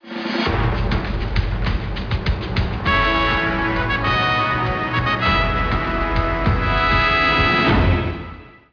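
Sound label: music sting start; Mike the Knight and the Great Gallop; 3DS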